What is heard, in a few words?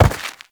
Step Dirt; Warframe; Uriel